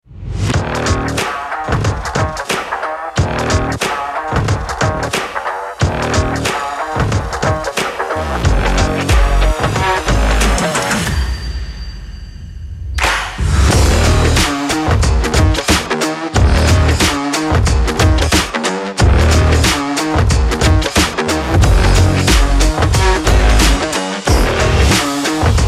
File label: sport trailer beat; hwa ting sports podcast